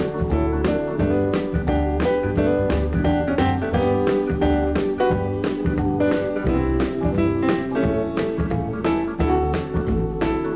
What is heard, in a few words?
country